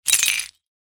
shells handful down on ground; foley; zapsplat

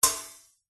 impacts metal wire basket hit strike

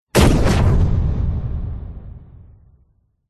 science fiction cannon gun blast

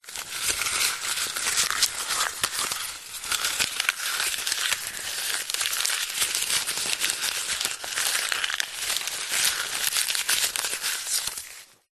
foley raffle tickets handful handling; zapsplat

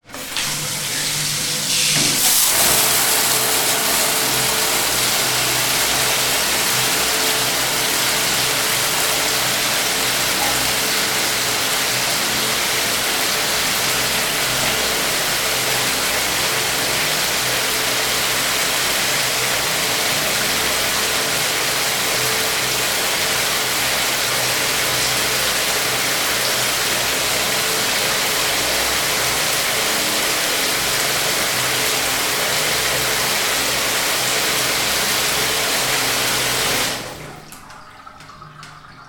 household shower on off; sound effects one

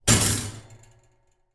impact metal basket draining board hit resonate

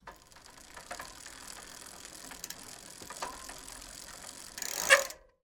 transport bicycle pedal sprocket brake

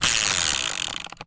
leisure game spin wheel; game of life; zapsplat

